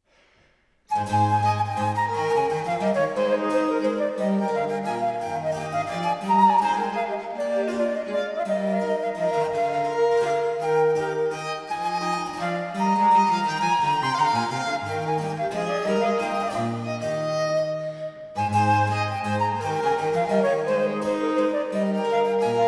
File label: Sonata A-dur Courante; Hotteterre; op 3 No 5 movement 2